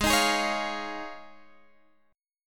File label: D sharp 7th Suspended 2nd Suspended 4th G sharp